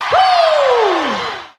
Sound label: "woo"; Voice; ooh ahh